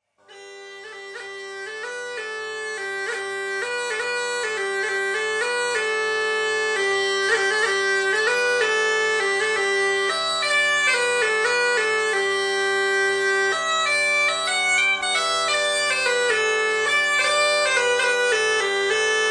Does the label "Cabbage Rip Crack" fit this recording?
No